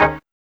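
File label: ORGCHD, organ chord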